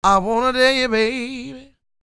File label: SING, Vocal Planet Blues Soul BLUESY